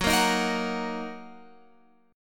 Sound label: Gb 6th Gb down Guitar Open F; Listen to Gb6 strummed